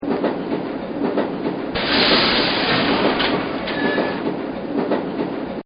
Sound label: TRAIN and Steam; Trains; Sound Effects:Vehicles